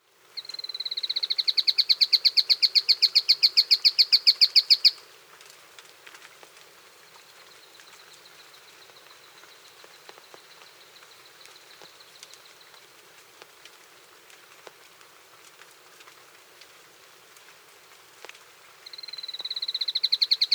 Song and calls, Birds of the Costa Rican Cloud Forest; N. America; Natures Songs